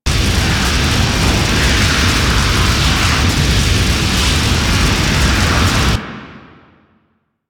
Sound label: Fire Loop, Flame Thrower; Weapons; Warframe; Ignis Wraith